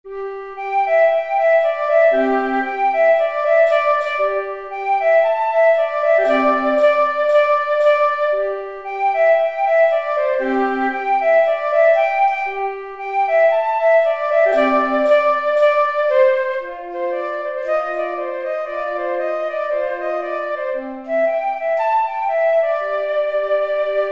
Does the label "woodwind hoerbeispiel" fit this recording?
Yes